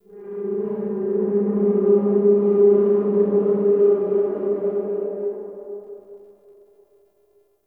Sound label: Eerie Drone